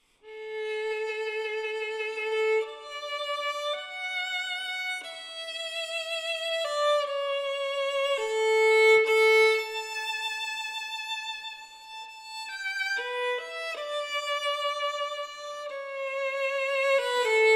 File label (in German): Violine